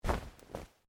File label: Clothing Shirt Cotton Move